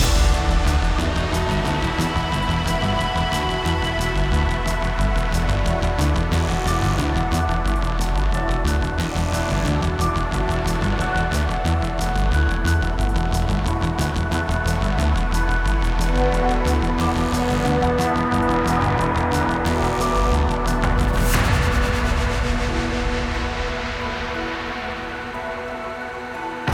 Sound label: Distress